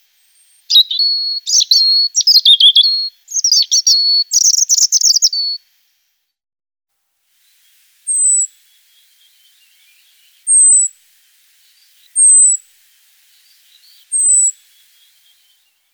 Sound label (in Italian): Pettirosso Erithacus rubecula; Versi Animali; Il Forum della Fotografia Naturalistica